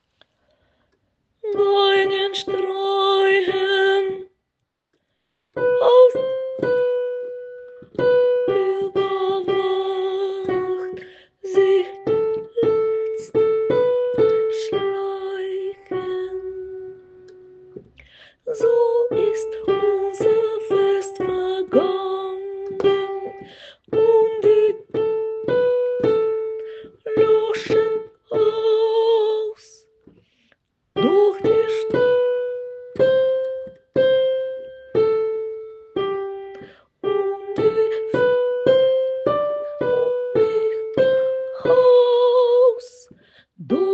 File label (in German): Uhren schlagen Sopran